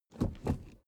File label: suv idle 1st gear change hard; verkehr transport; autos